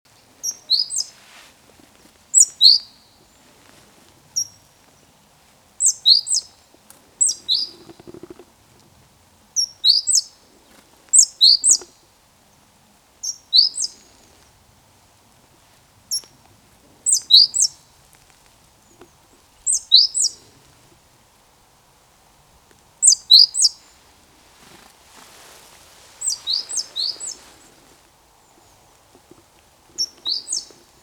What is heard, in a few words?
Parus major; SETE